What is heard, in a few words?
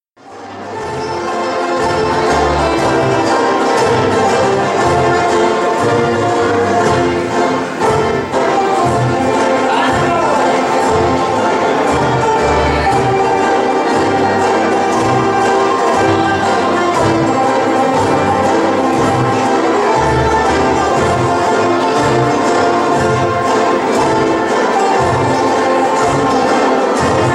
Banjo Band